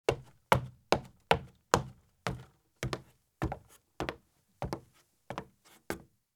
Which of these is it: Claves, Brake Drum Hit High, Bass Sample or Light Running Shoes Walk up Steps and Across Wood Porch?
Light Running Shoes Walk up Steps and Across Wood Porch